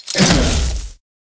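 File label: flying armor attack up; letaushii dospeh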